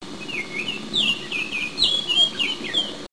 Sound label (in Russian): zvonkoe melodichnoe penie ivolgi